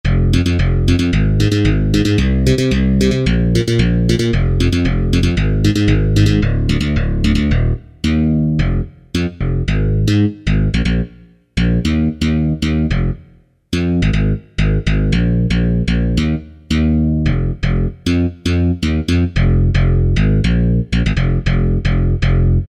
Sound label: DigiBass, Kurzweil K2000; Kurzweil K2000 Contemporary ROM